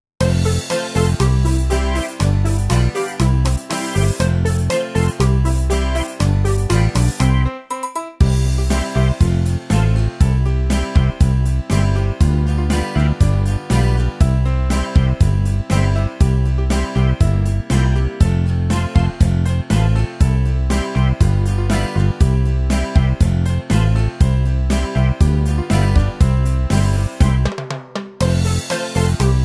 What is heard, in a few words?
Karaoke